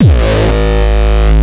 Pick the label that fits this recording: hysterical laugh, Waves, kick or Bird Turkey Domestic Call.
kick